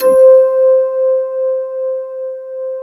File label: C4, Synth; Big Fish Audio; Synth City